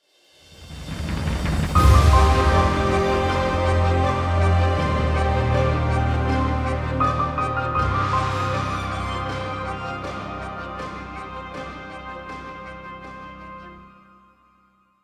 music interactive; World of Tanks

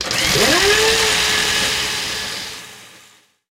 rotor m61 start out; cannons; War Thunder

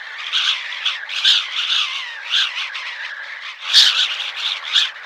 wind wire whip

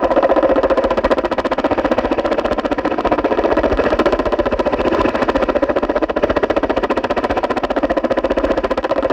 Aircrafts Engines Rotor KA50 Dyn Cockpit; DCS

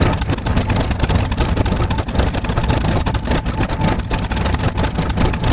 Aircrafts Engines Jumo213A Ex R; DCS

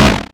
GAU8 End; Weapons; DCS

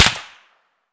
sonic impact; Escape from Tarkov

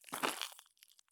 walk gravel; Escape from Tarkov

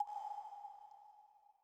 water drip; Rust

